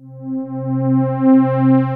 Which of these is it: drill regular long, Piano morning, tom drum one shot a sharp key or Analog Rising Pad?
Analog Rising Pad